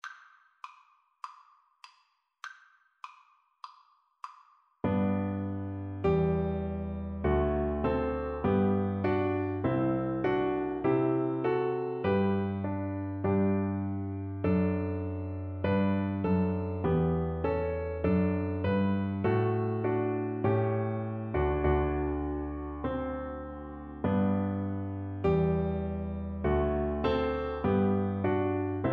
Oboe

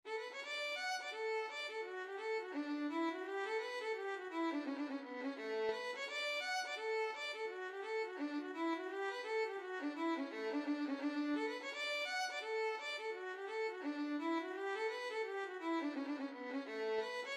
violin; goodnatured man

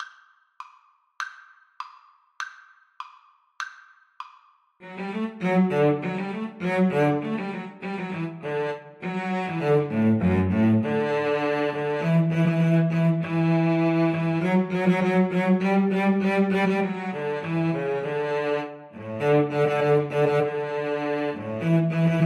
Cello Duet

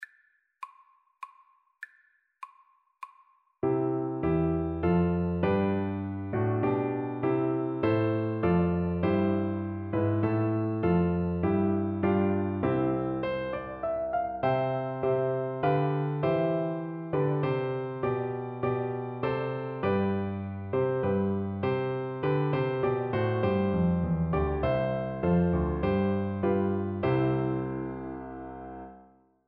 god save the queen; Percussion

